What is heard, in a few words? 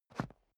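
step boots concrete slide short, footsteps; menschen; schritte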